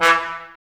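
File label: brass trombones mute; Miroslav Vitous - Woodwind Brass Ensemble; Tbs Mute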